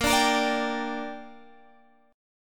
G Minor A sharp, chord

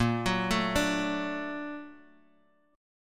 Bb Augmented Major 7th Bb down

